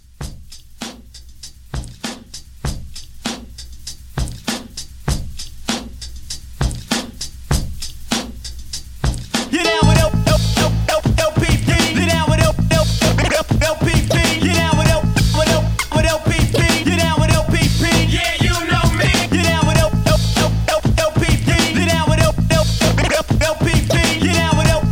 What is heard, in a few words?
Mashup Re Drum, 98